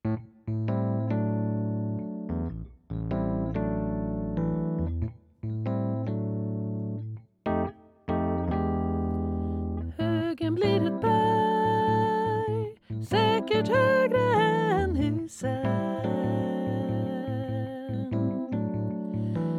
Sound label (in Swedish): Sopran